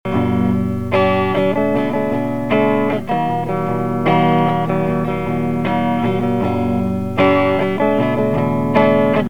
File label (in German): geschrabbel sauber; elektro